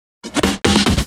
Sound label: Fills, Loops 140 BPM